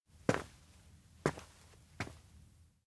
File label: steps indoor approach slow; meguriai; anemoi パッケージ版 1.1